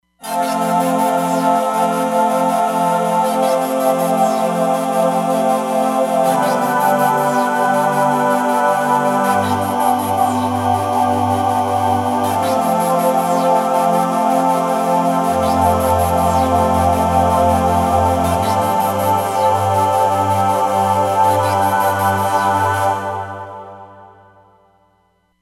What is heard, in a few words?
Sliced Choir, Roland Fantom XR